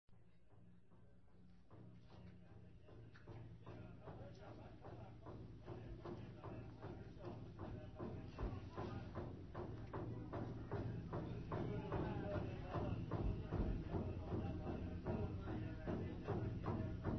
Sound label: Fusion Music Raga; RKC Live Stream; Travel India